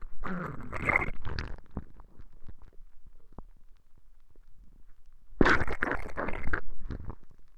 toma mate

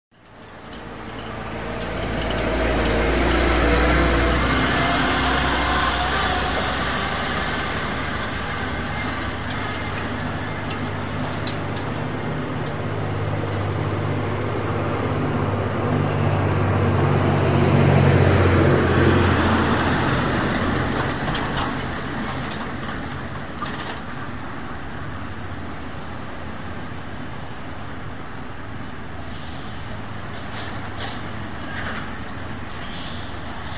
calles paso a nivel; av las palmeras 4200

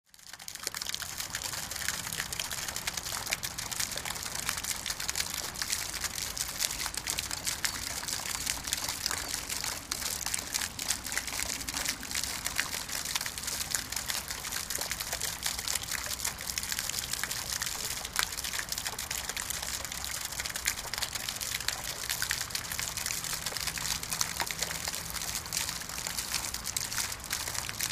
parque rosedal fuentes de agua; parque de la independencia; Rosario

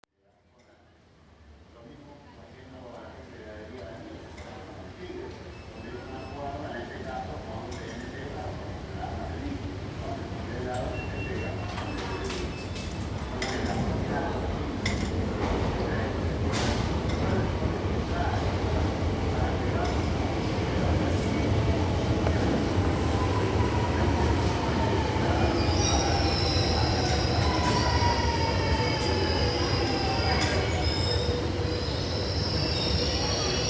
subte linea d estacion; caba; palermo; bulnes

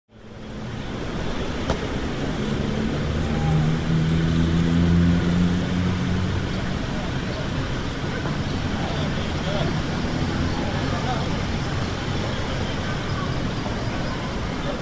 parques regional sur personas jugando futbol

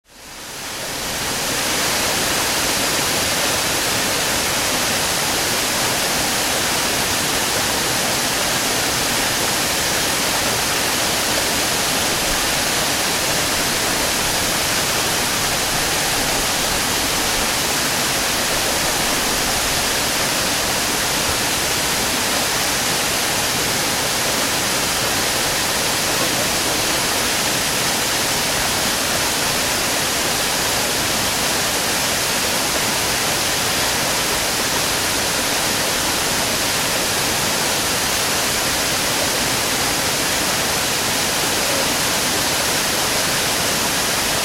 cascada, puerto libertad; misiones

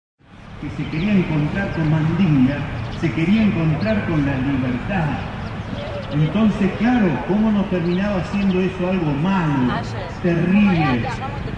actos; plaza pringles 26 jun 2007

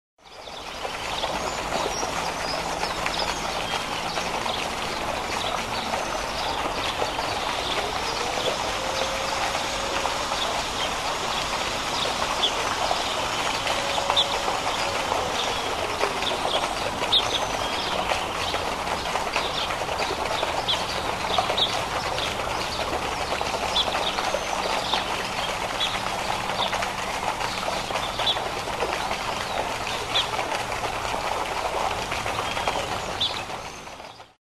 plazas fuente de agua; santos dumont; Rosario

